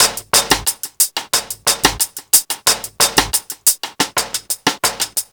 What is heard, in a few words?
Percussion, R&B Drums